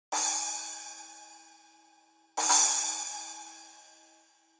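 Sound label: Resonant Splash 10, cymbal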